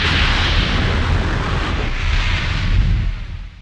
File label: big hiss, fire trap; hl2